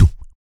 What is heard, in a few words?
MACHINGUN, vocal; ILIO; Vocal Planet; Blues & Soul